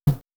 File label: clap right